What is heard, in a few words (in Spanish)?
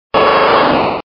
Grito